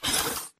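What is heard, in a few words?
Tick Movement Servo Walk; Titanfall 2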